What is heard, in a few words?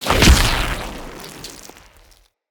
Root Spider Mother Web Shot Proj Burst; Animals; Spiders; Abilities